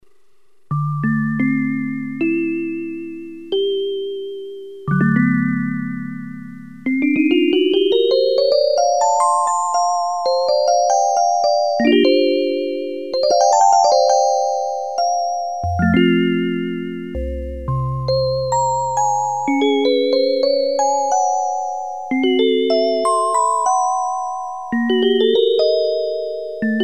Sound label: VIBRAPHONE, Casio CZ-1000; Preset